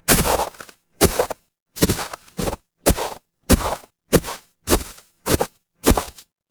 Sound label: footsteps snow